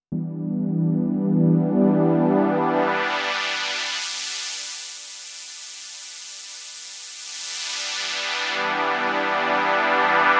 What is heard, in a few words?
Waldorf Blofeld hires manual bpf